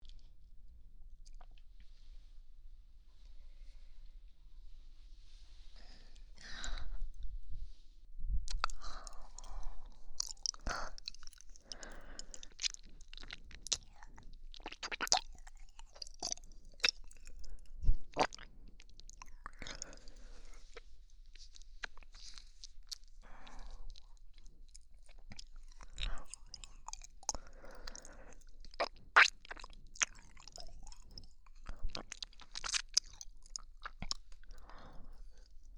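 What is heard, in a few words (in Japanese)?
チョコ舐め（左耳・チョコ舐めのみ）; 2024チョコ舐め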